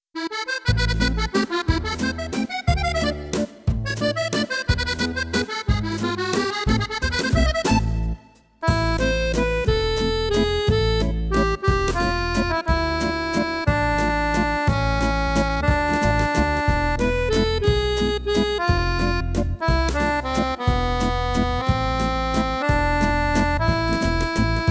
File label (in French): Musette